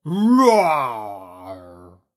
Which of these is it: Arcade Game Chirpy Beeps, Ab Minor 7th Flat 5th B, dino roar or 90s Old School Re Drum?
dino roar